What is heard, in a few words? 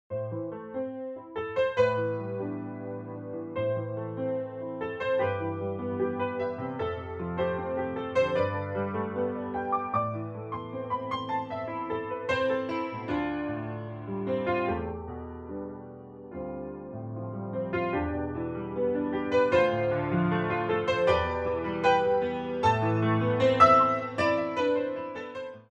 chorus